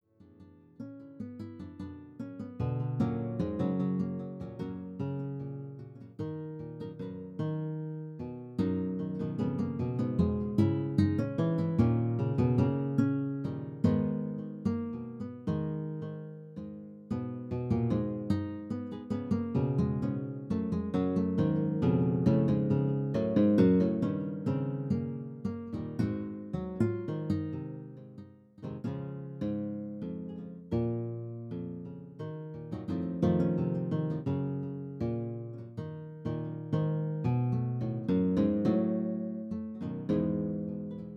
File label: Classical Guitar